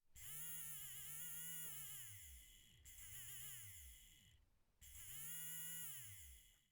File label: handheld motor running